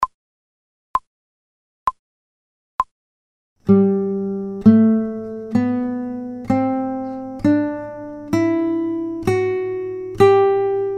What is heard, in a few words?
G Major Scale slow, without metronome